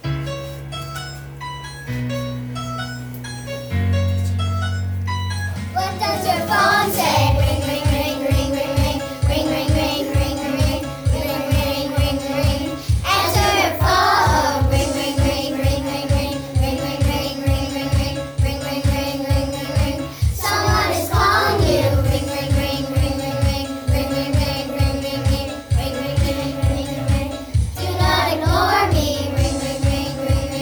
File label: ringtone